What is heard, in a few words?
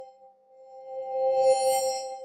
chime